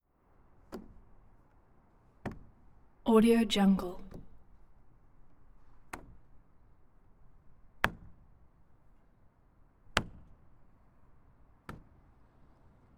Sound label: vehicle center post grab